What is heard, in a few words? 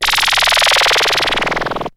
ZIPPER; SoundFactory; SMPMANIA; EFFECTS